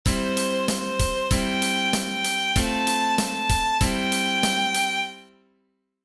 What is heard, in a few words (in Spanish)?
Ritmo, violin